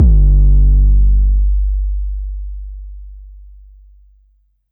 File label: 808 Huge G, Synth